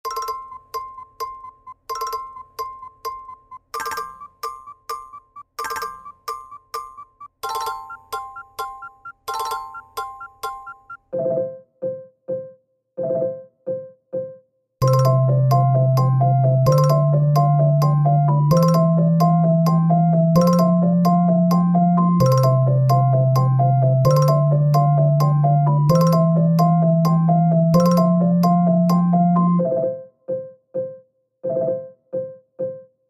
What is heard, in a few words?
iphone 15 chirp